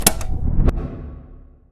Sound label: Electrical Distributor Correct